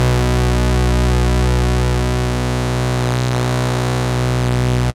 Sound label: Long Analog; instrumente